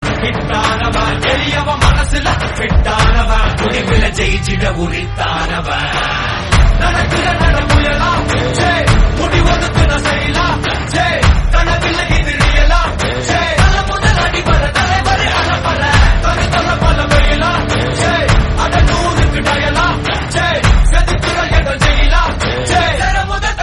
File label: Tamil Ringtone; ringtones; Jailer; Rajinikanth